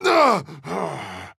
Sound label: Hurt